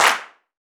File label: Gospel Clap; Roland BK-5; Claps & Snaps